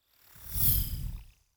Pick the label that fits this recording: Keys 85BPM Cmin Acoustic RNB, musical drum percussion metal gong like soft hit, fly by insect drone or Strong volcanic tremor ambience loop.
fly by insect drone